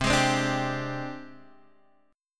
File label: G sharp Major Sharp 11th C, chord